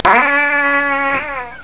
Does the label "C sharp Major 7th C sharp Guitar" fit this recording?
No